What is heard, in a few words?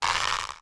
Slingshot Pull